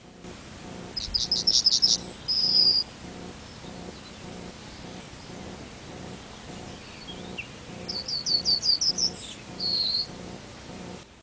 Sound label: Emberiza citrinella